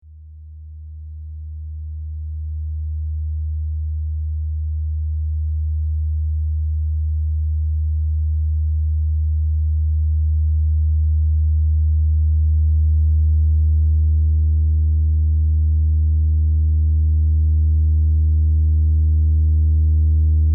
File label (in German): gong klang beispiel